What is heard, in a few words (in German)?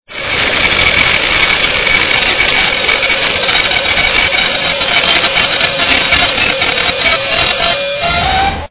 zug bremse